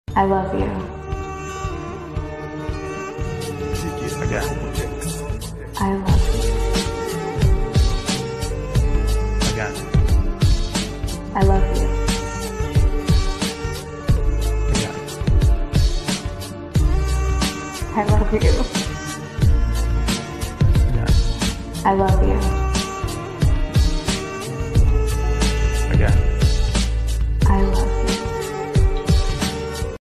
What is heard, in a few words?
Heart Touching Ringtone